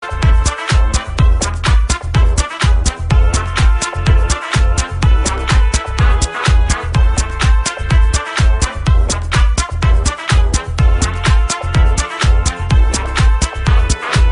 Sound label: Trumpets victory